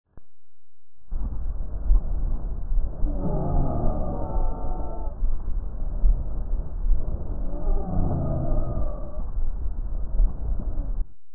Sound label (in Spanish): Sibilancia Adulto